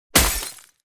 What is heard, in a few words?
mask break